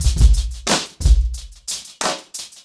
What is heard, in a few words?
90BPM, acoustic drums; The Roots Drumloops